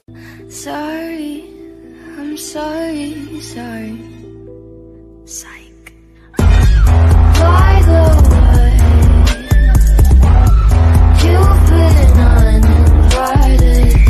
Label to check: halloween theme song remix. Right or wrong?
Right